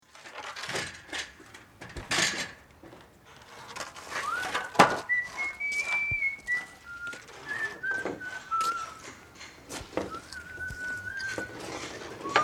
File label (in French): chantier sifflement ambiance; Culture sonore